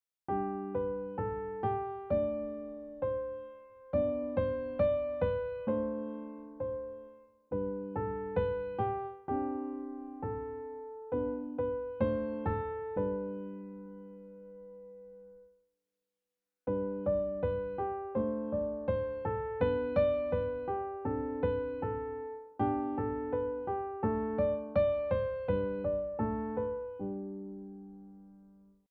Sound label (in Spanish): Dobles notas Sol